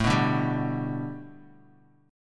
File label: A 7th Suspended 2nd Suspended 4th Bass Standard; A7sus2sus4 strummed